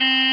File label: Horn Stereo